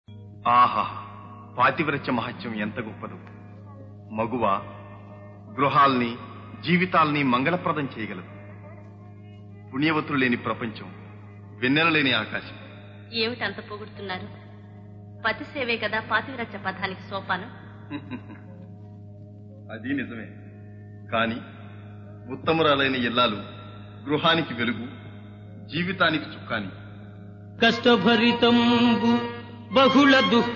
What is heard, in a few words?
Padyam; Chintamani